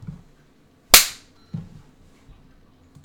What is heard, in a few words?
Smack